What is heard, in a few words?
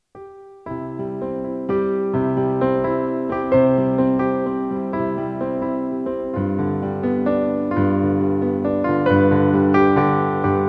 Lullaby Impro